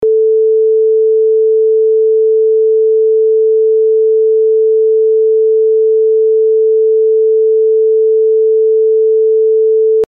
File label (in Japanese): トーン サイン